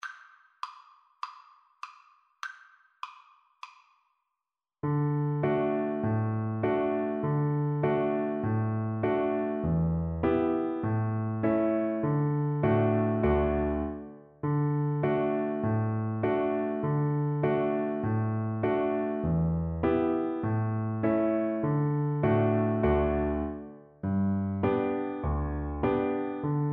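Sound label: VLC karaoke, Cello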